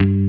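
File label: PICK AC BASS; Pro-Rec - Synthsonic; TRINITY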